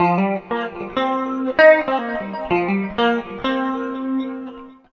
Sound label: GUIT; East West - Phat & Phunky